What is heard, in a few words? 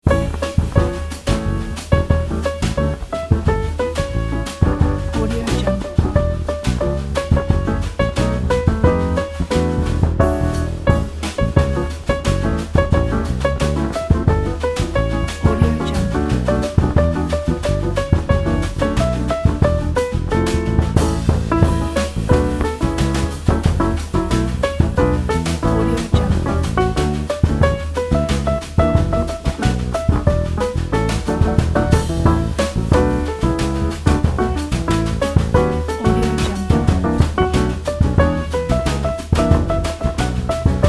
jingle bells jazz